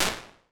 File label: Long Floor, Impulse Responses; Small Spaces; Indoor Spaces; Apple